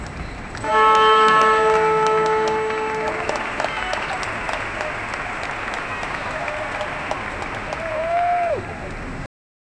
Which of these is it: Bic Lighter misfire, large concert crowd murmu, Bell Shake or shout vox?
large concert crowd murmu